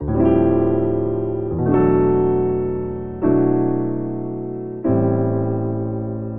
Progression Chill Sevenths Dmin - Emin - Bmin - Amin 75 bpm, Grand Piano; MIDI Clips; Ableton; Factory Packs